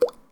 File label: Water